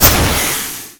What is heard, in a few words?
attack; brainrot; italian brainrot 3; venomancer